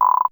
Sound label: GUIRO; DRUMS & PERC